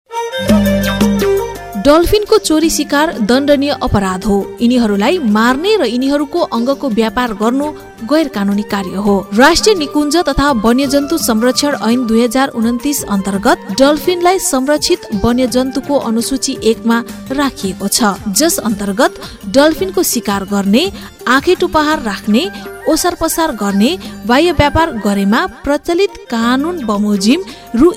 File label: River Dolphin Project Jingle